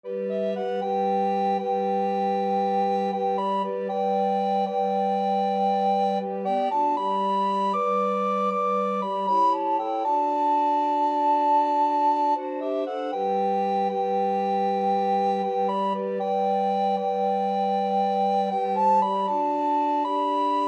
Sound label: recorder quartet